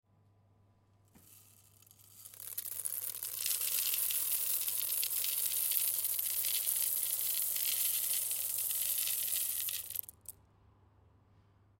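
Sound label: coffee beans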